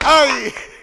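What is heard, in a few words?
CLAP + VOCAL, MCLAPS+VOX; Best Service; World Colours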